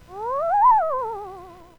Ooo Scary

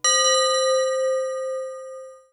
Tubular Bell

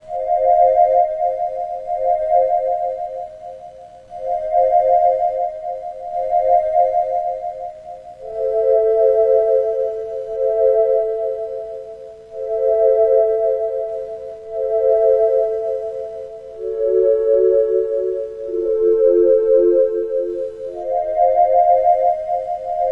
Sinusoidal; Ambient FX

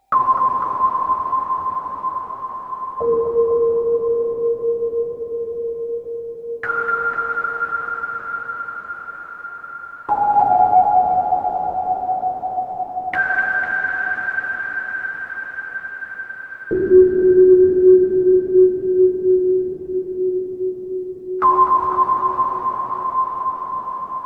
Submarine, Ambient FX

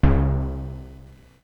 Timp

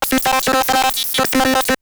Mechanical

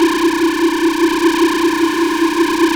Laser Loop

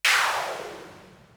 Airshot

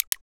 Menu Click

